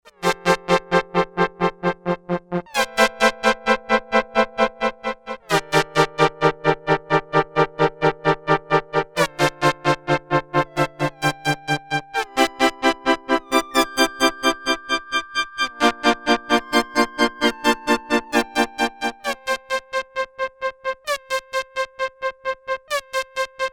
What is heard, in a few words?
Pulse width mod 1, Sequential Circuits Six-Trak; Factory Programs